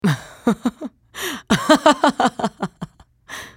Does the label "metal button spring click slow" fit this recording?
No